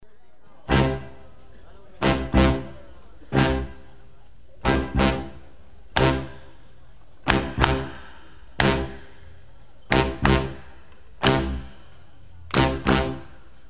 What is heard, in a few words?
Dixieland Rock